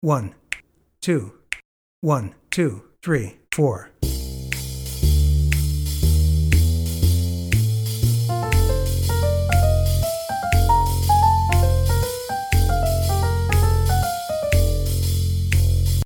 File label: 5 in 4 swing; bird and diz theme